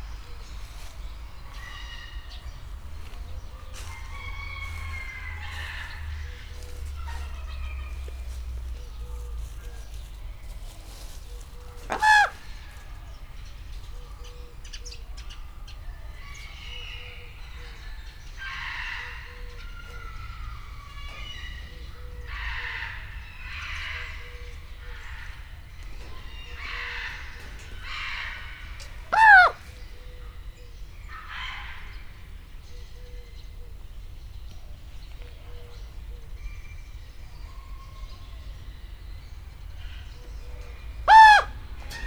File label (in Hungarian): holló; állathangok; nyíregyházi zoo 2010